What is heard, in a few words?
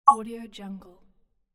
glass blip toggle